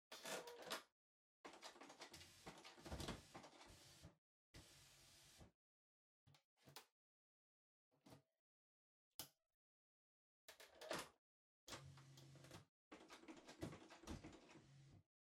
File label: Printer Far Ink Replace